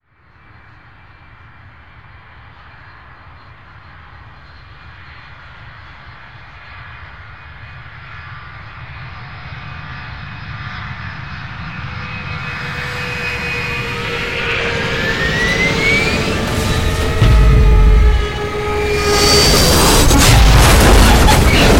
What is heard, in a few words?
airport rough crash seq